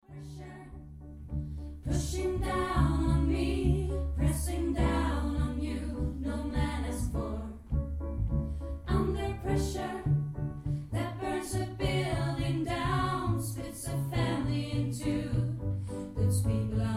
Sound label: Ensemble